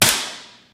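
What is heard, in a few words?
physics clipboard impact large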